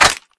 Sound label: mp5k clip in